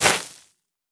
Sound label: run ground stop